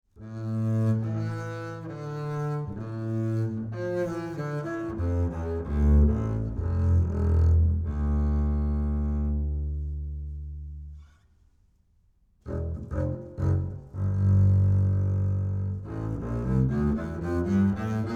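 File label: acoustic bass Bowed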